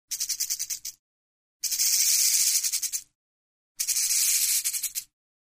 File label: Rattlesnake, Mojave Tail Rattles. Intermittent Rattlesnake Rattles. Close Perspective.